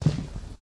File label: plexi step; Golden Crayon Sounds